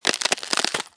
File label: freeze; merge-dragons-2